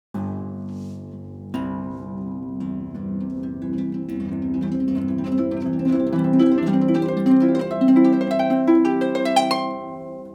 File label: harp